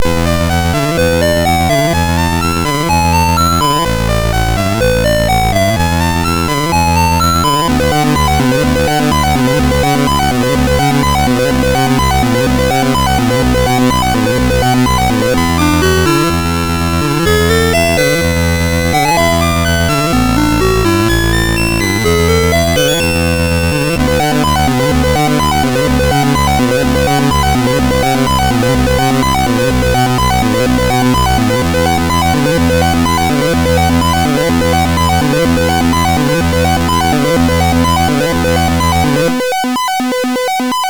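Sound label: Penetrator, MOS 6581 SID chip music, games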